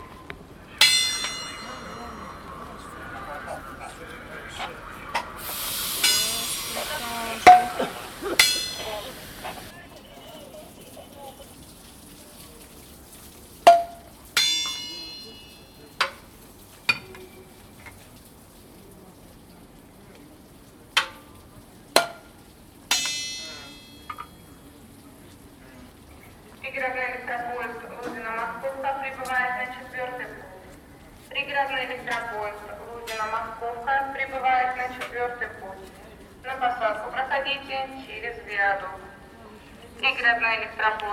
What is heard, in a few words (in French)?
l homme qui check le train a quai